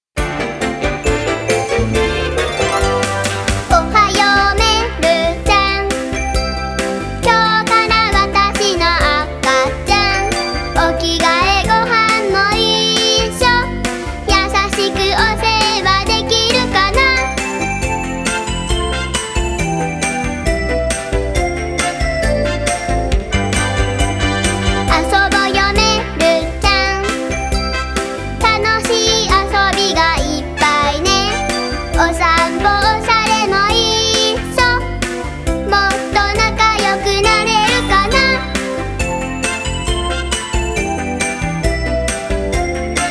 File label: original full solo